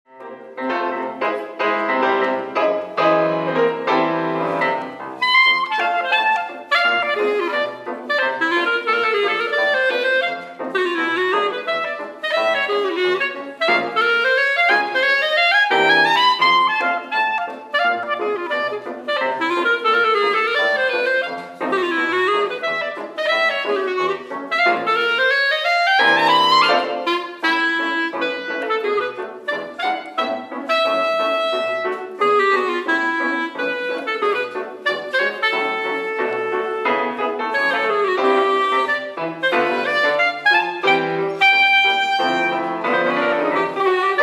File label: Jazz